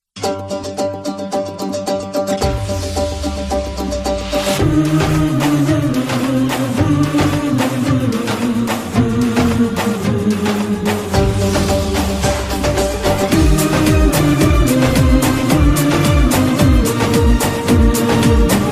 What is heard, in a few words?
dombra